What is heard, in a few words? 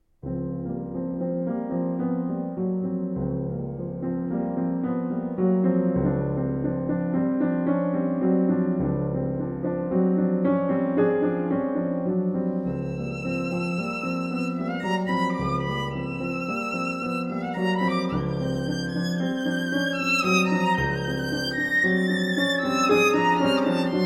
sonata for violin and piano allegro moderato molto vivace e scherzando